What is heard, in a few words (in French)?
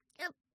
Chauve Souris Efforts Courts; mirumiru